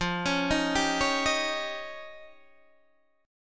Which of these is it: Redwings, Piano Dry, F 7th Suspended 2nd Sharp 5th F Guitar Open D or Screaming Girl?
F 7th Suspended 2nd Sharp 5th F Guitar Open D